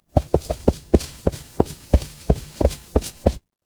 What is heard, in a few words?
footsteps concrete